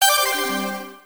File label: WIN; Sea Sirens